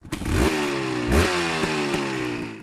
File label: engine start